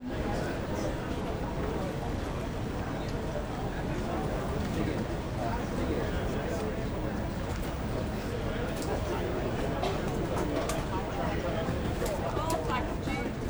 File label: Interior Manchester Airport, Baggage Claim, Waiting; Commercial Aircraft Interior; 344 Audio